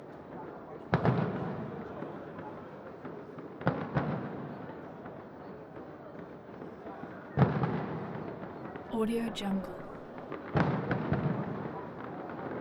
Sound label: city centre distant fireworks